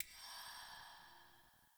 UI Default Map Hover

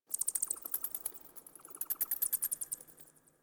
Insect Night; Warframe; Ambience; Eidolon Plains; One Offs

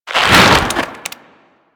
Infested Devourer Movement Whoosh B; Warframe

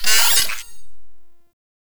Proto Infested Mutalist Pain; Warframe

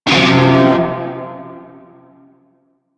Shawzin Hirajoshi Chord G; Music; Warframe; Narmer Shawzin

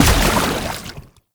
Step Water, Footsteps

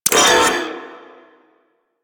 Mech, Weapons; Warframe; Cephalon; Ceph Primary

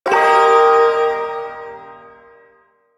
Shawzin Blues Chord H; Music; Chords; Duviri; Ersatz

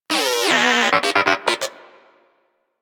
Moa Inquisitive Vocal Disagree; Warframe

